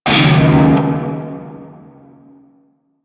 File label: Shawzin Yo Chord D; Warframe; Narmer Shawzin